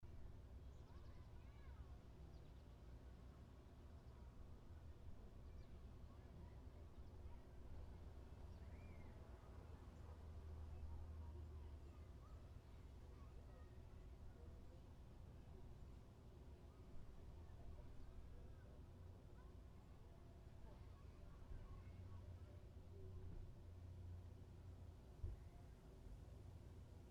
summer beach parking lot

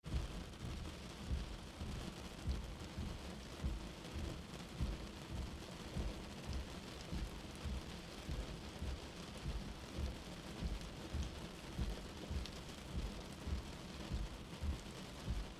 fast wipers in rain

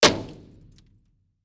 glass and metal collision

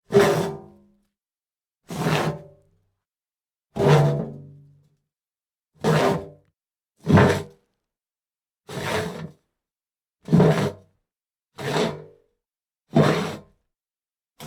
slide on metal plank series

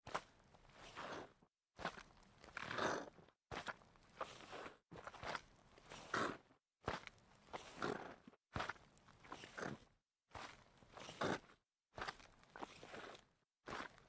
wet shoes walking series

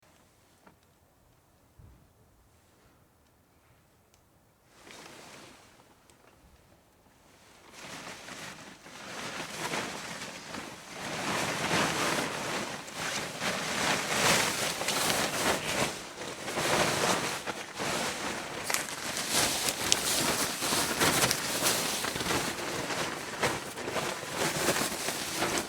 radiation suit on off

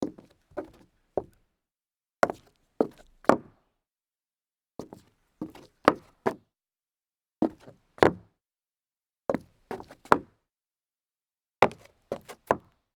turnaround on solid wood

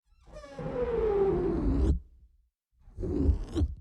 deflate

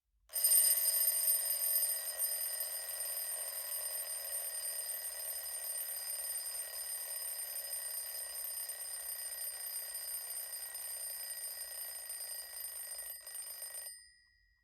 mechanical clock ring

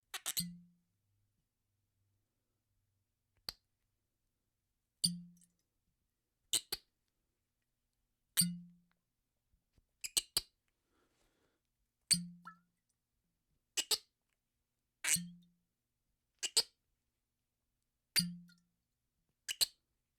cork in out bottle squeek series

